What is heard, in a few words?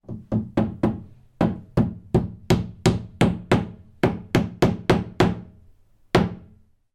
industrial hammer nail into wall next door